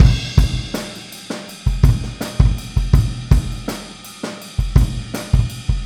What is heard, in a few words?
Boogaloo Chorus Kick, 82 BPM